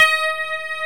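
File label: guitar, clean electric guitar; Ultra Sheen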